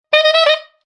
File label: Quick notes on a saxophone.; Horns; Musical